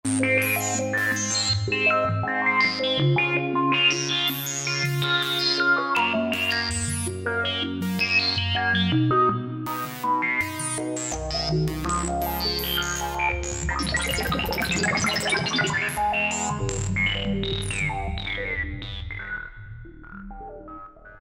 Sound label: Trancer